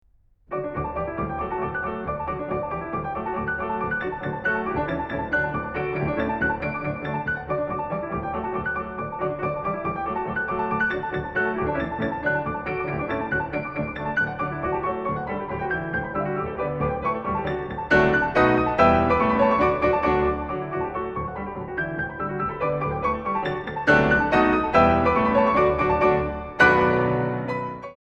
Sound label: Molto vivace, Hungarian Dances for Piano Duet; Brahms; No. 18 in D; 21 Hungarian Dances WoO 1